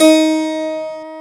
HARPSICH

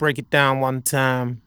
VOCALS; Ueberschall; Jam Box

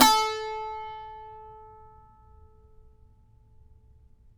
SAZ A 4; Spectrasonics; Hans Zimmer Guitars VOL-2

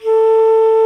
ALTO FLUTE A4, WOODWINDS; Greytsounds VOL-1

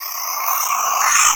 FX REVERSE

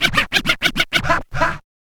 scratch kit, vinyl; Masterbits; Vinyl Attack; scratches

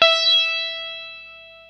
EL SITAR E4; E-MU Formula 4000 Series Vol. 3; Analog Odyssey

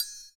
CYM, Ethno Techno; ILIO - Ethno Techno

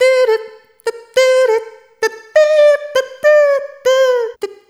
Rhythmic A

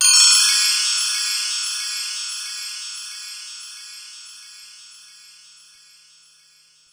BellTree, Korg Percussion

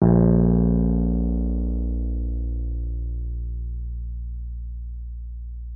WURLITZER A#1; Xsample VOL-1; WURLITZER RS

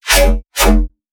Riddim Bassaa

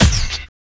BD, Drum & Bass, 160 BPM

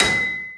CLUNG

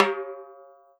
TIMBAL, Salsa Kit; E-MU Producer Series Vol. 7 – Old World Instruments

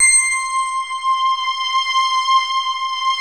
synth bell; VFXSD

